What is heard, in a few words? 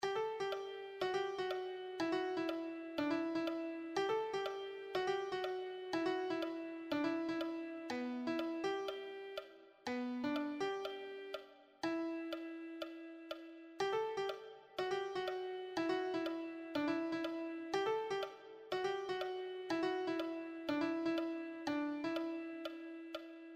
melody